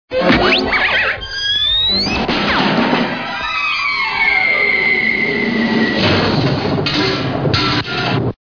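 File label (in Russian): всевозможный грохот из мультиков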